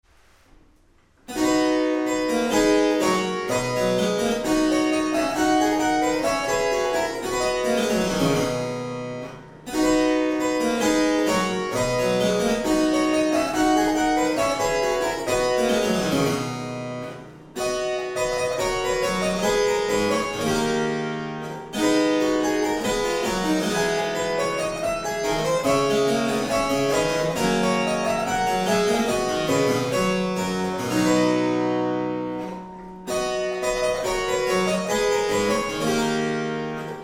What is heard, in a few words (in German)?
Italienisches Cembalo